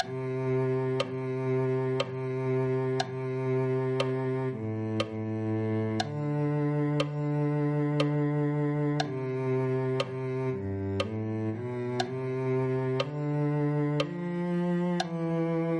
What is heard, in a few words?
cello